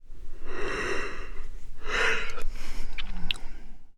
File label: Yawn; The 39 Steps